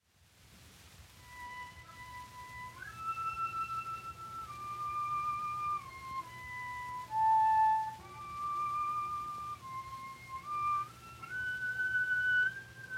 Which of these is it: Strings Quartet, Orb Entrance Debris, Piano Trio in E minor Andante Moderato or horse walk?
Piano Trio in E minor Andante Moderato